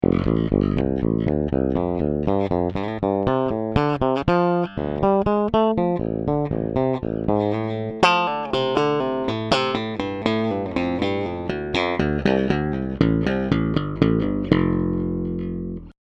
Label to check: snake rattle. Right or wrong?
Wrong